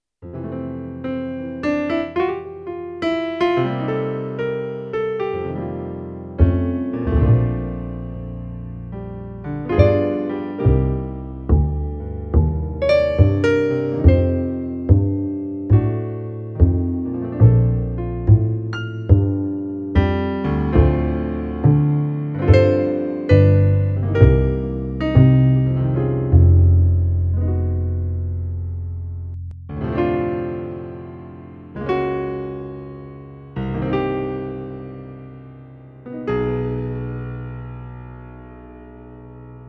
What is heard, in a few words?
accompaniment